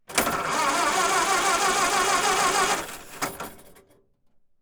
DEMARREUR ELECTRIQUE

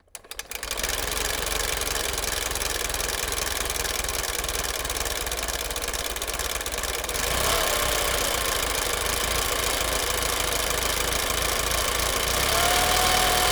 Polymoteur Dubois

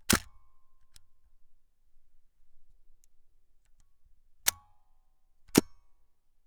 RELEVE MIROIR DECLENCHEMENT

